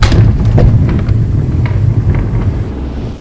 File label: door move